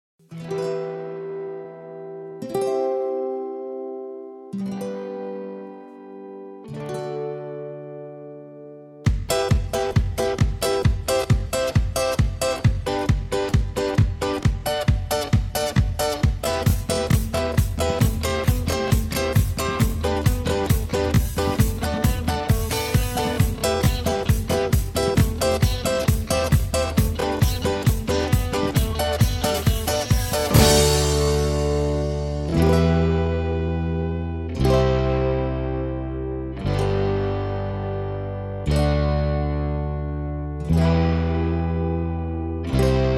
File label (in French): a nos mois playback